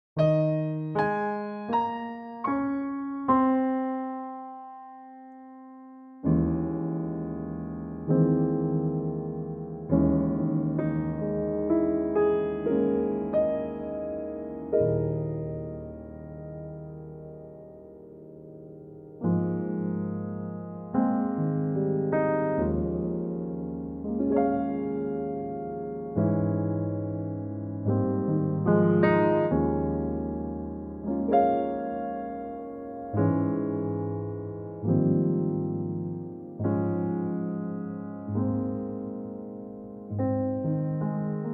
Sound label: Ab piano; key - Ab - vocal range - Eb to Ab (optional Bb at the very end); Wonderfully expressive piano only arrangement of this classic gem; Pianotrak